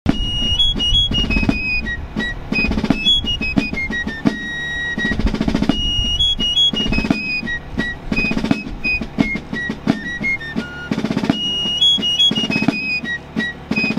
Revolutionary music

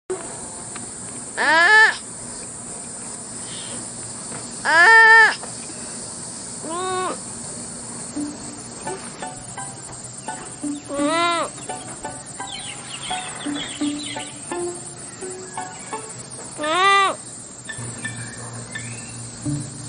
Sloths Sound

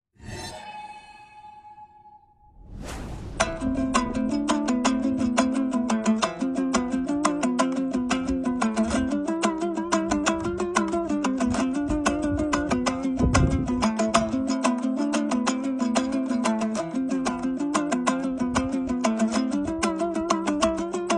Komuz

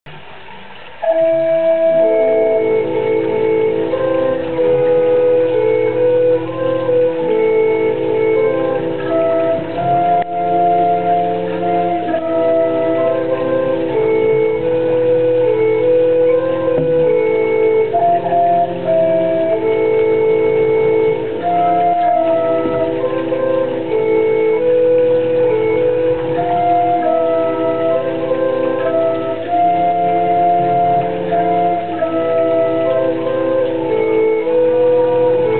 Hydraulophone

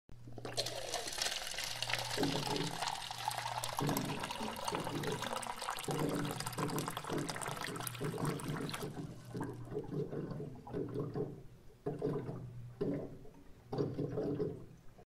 Water cooler

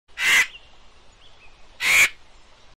Indian vulture

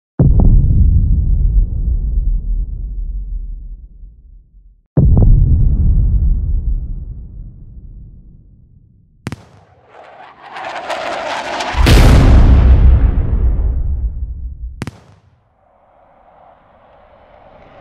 Mortar 60; خمپاره ۶۰

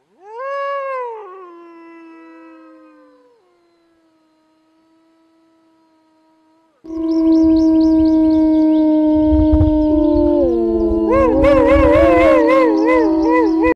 Coyote; زوزه; کایوتی

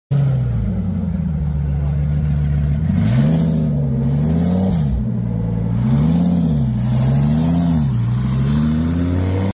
Lamborghini Gallardo

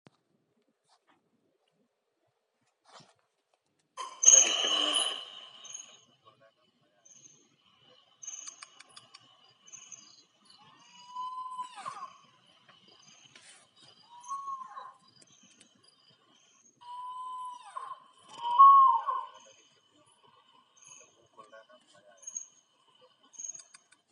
Spot bellied eagle owl

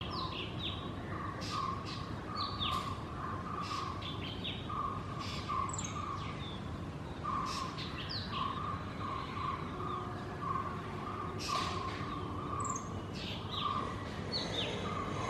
Ashy drongo; بوچانگای خاکستری

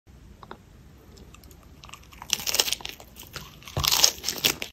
eating lettuce

